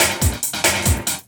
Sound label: LOOP SD, 140 BPM; Ueberschall; Drum & Bass Resonance